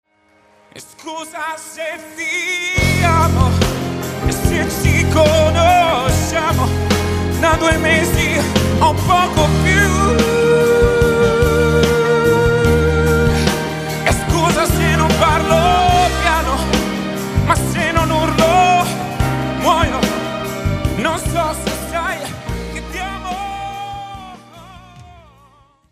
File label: piano